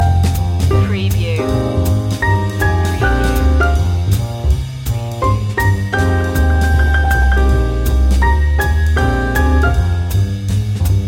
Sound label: in paris loop